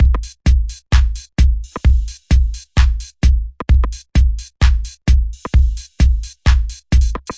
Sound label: 808 percusso slight swing, drum loops and breaks